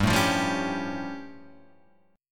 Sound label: A Augmented 7th G